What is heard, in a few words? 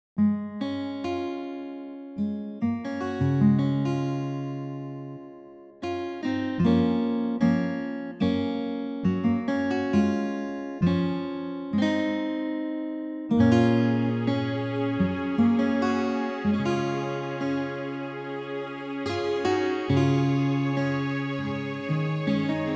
Western Ballad